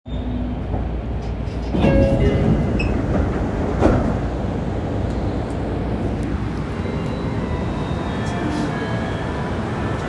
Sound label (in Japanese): 池袋線椎名町東, train sounds; 西武